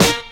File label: smooth snare drum a sharp key